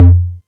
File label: Tom Lo, Novation Drumstation; DrumstationV1